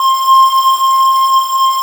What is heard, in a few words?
FUZZ PIPE, Synthy Tonal; Distored Reality